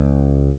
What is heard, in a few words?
pluck bass; Amiga Soundtracker sample